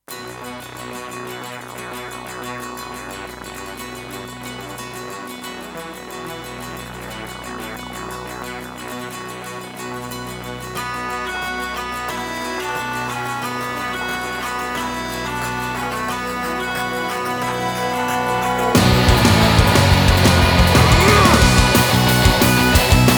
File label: Bagpipe Rollers